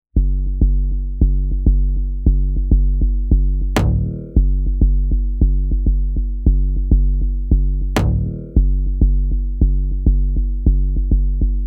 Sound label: Minimal Glitch Electronica Slight unease; CUES for film TV games etc